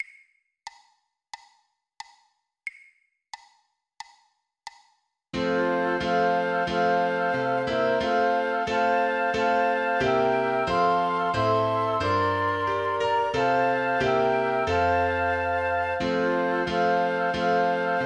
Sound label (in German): Flöte Klavier; 90 bpm, 2x; Hark! The Herald Angels Sing; weihnachten